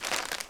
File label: paper step; Golden Crayon Sounds